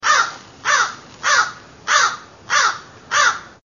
Crow Caw sound

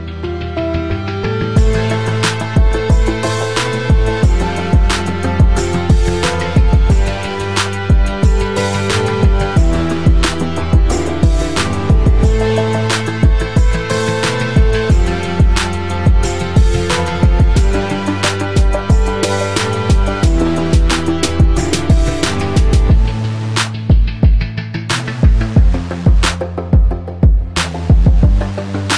Hiphop Beat

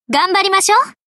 Skill Activate; Eli

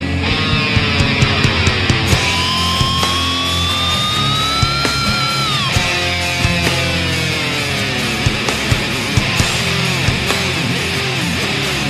Fire Tune

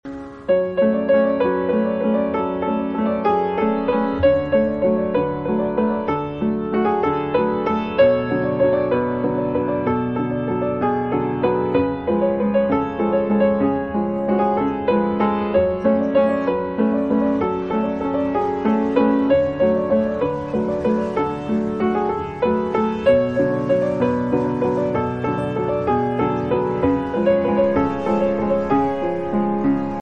piano

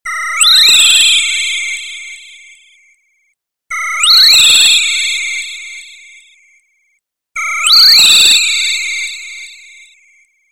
Bizarre Ringing

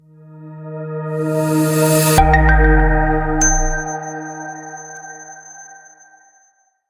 notification

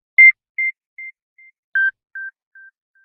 iihaa Alarm

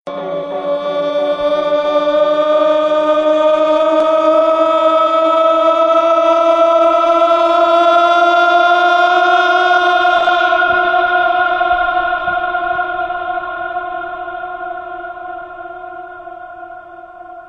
Eerie Shamen Wail

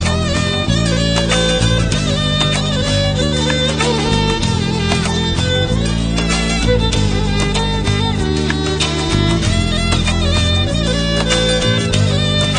Sad Violin

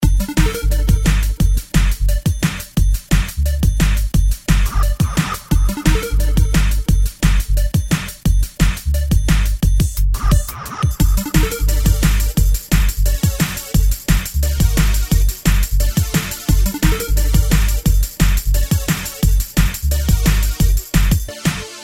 Dnb Mech Steps

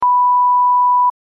Censor Beep

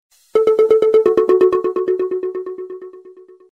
Drum Sound; Sonic

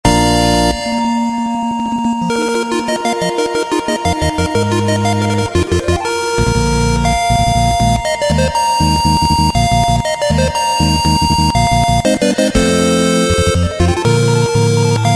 8-Bit

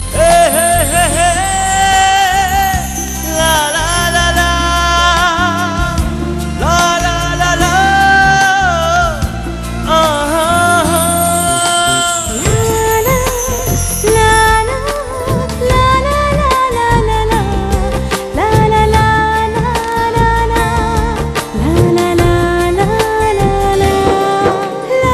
Instrument

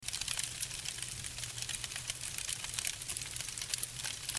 Fireplace

